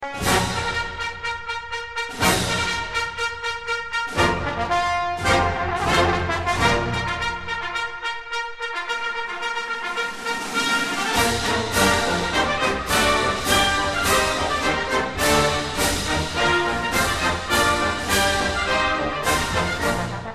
Fanfare

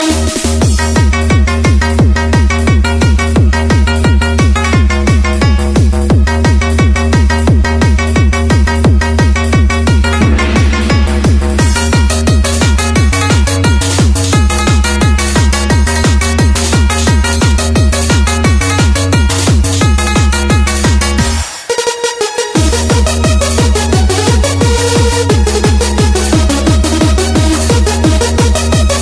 Asian Beating

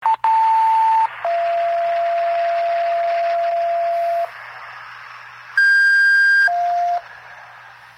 Dispatch Tones

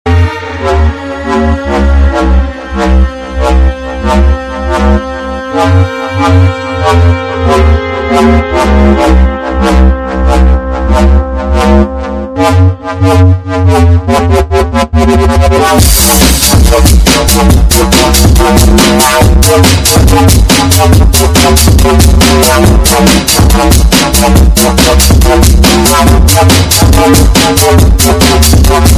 Drumnbass

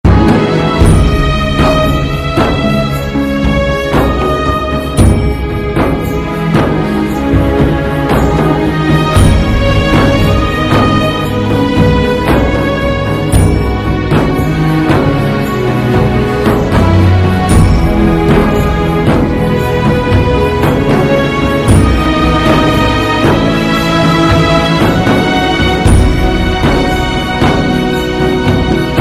Sad Music

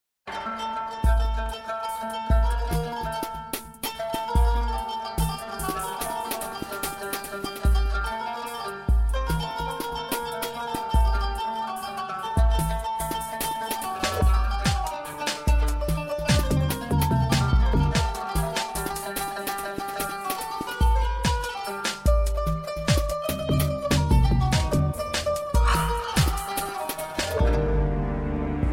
Arabic; Sand

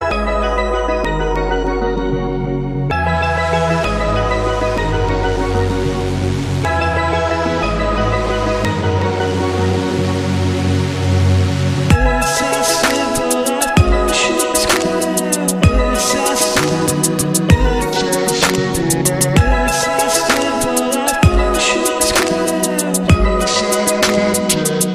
Wavestep

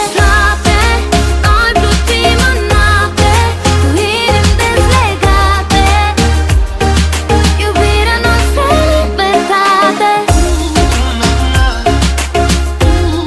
"Mmm"

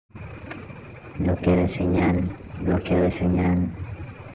SIGNAL LOCK

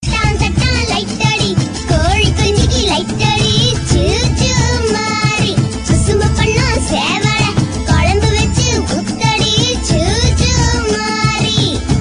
Kids Children Song

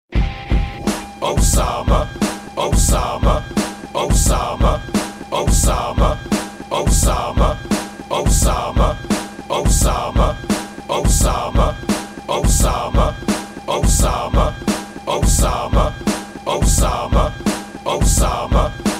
loop

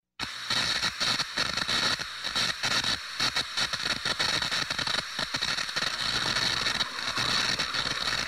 Electric Static

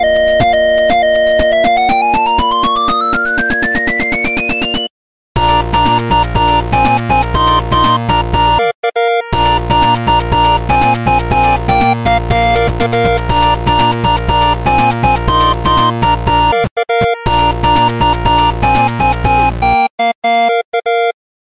Happiness 8-Bit

